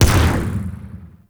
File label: Swarm Missile Explosion; DE; Warframe; Weapons; Sentinel; Helstrum